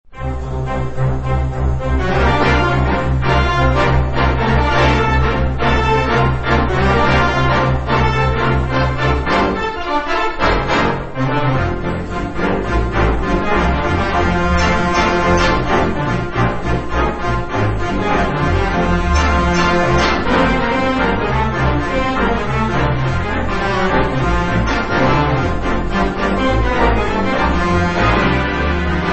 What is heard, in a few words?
Organ Stop Boogie; dance